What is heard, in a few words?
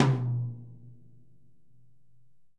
Tom mf; Multisamples; Digital Sound Factory